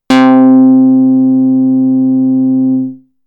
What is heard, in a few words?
Multiwave Lead